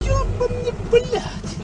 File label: Changing gears